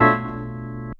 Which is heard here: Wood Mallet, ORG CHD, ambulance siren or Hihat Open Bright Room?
ORG CHD